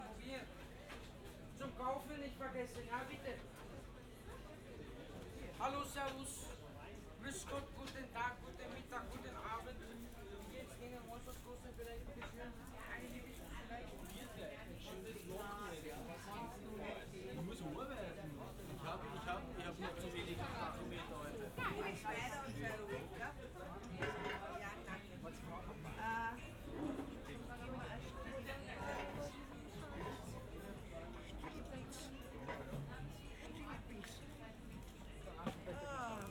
Naschmarkt; Lexikon-Roman

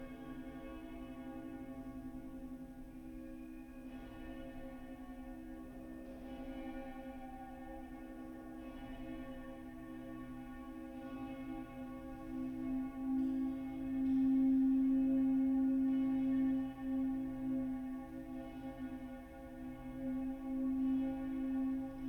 Glocken; Lexikon-Roman

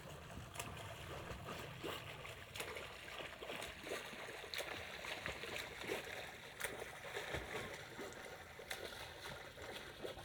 Schwimmer; Lexikon-Roman

